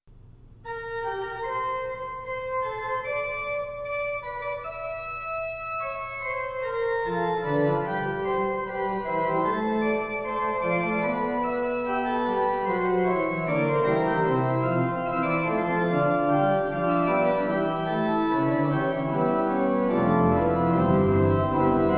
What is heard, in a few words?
Fugue in B Flat, organ; postludes